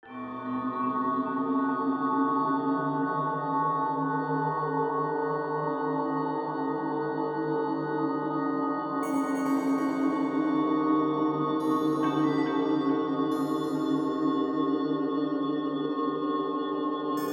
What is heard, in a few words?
Dark Tower Ambience